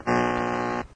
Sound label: Radio Interference